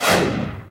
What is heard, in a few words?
panel open